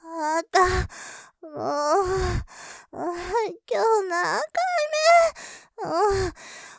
余韻; もぉ；；；今日何回目??；；(´>ω<｀); ループで楽しむ！シェフの気まぐれ抜き抜きコース; モノラル

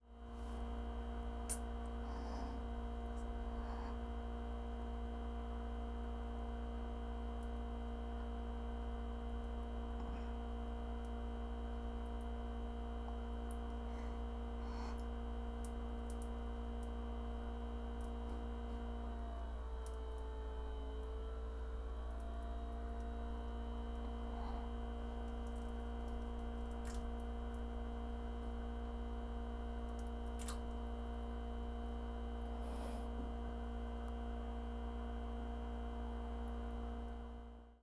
大、小さめガス音ミチ音ポチャ音吐息

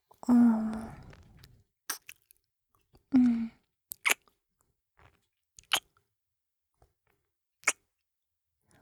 キス

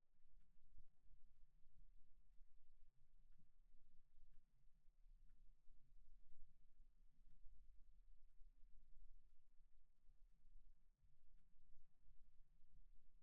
時計, 家の中の環境音; 3DIO

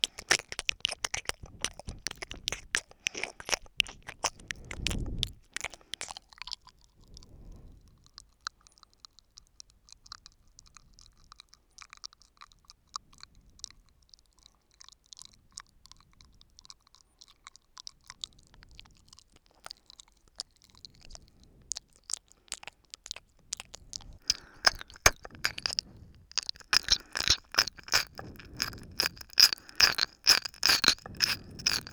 左耳深め

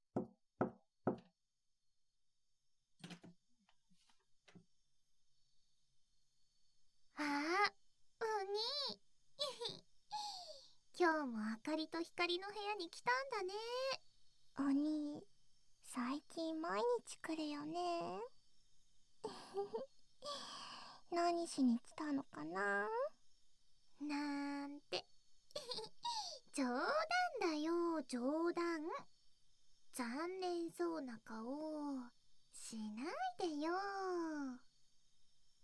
両耳舐め舐め; いたずら姉妹の両耳舐め舐めサポート付きおにぃのオナニー鑑賞

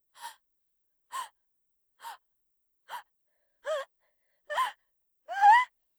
吸う過呼吸; 効果音なし

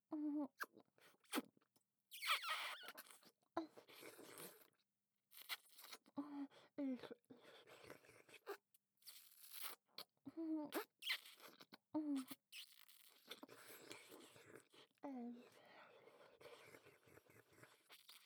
吸いながられろれろ; 乳首舐め; 喘ぎ、耳舐めのみ

